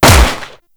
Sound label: Shot, Lever Action Pistol; Warframe; Grineer